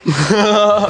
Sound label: mukhakhaakha